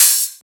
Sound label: Open Hat; FLPRO